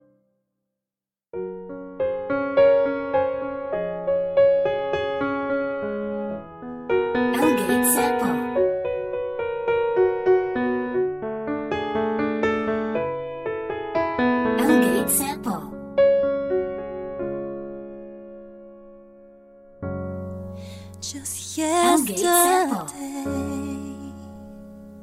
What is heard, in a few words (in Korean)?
영국여, 노래, Ballad